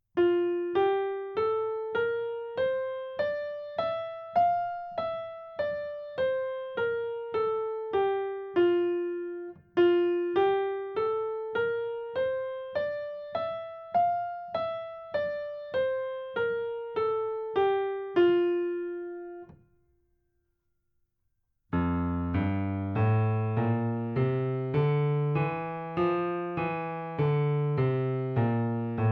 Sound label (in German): F-Dur Tonleiter Übung